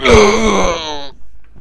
death; openffa

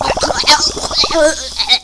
gurp; opentdm; astro

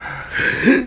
gurp; blade

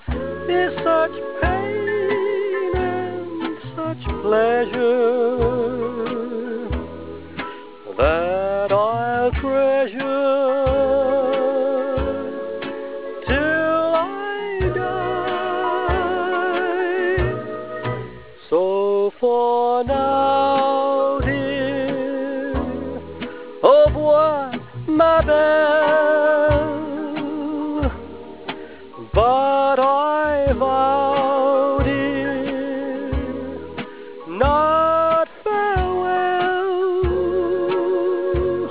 kiss

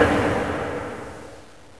metal; Pan

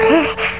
bump

